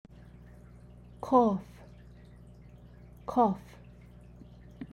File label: cough